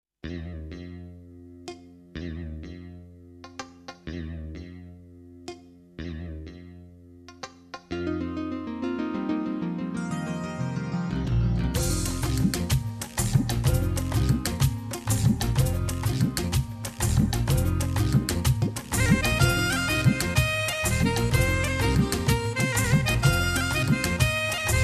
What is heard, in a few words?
Dholki